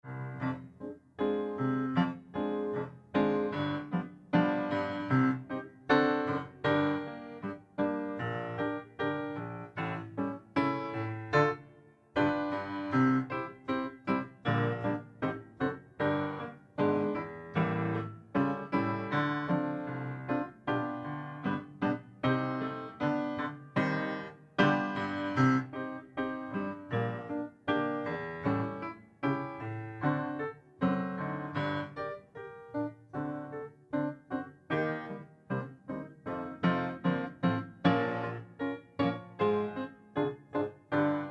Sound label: Sign Piano